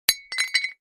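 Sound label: Large Tile Square Pieces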